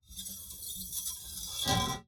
Copper pipe, tight, dragging, scraping, raspy, tonal, out, short, shudder; 5ft L; 075in Dia